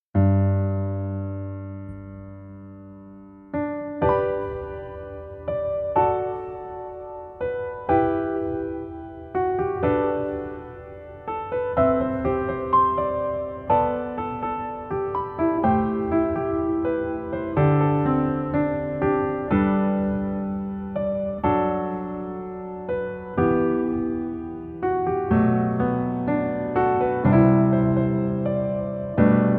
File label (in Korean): Piano ver, 멜로디MR, -1키